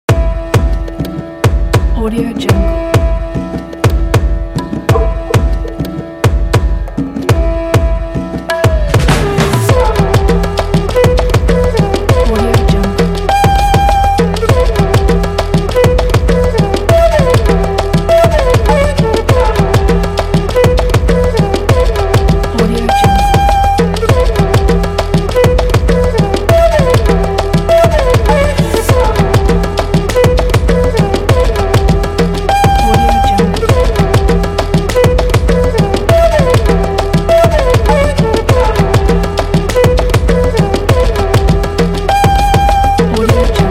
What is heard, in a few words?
fashion tribal